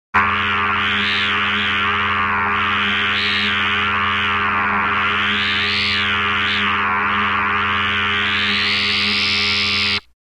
Multi-Pitched Oscillating Buzzes Low-Pitched Buzz w Var; Electronic Sci-fi